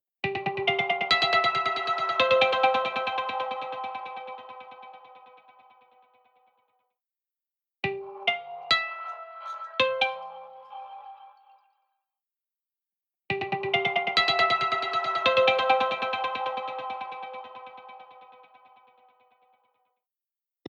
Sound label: Guitar Harmonics Rotating Taps Right Binaural; MicroPitch Immersive